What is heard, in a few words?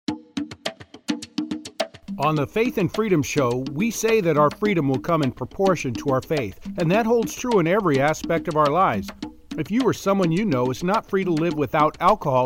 teen challenge with drums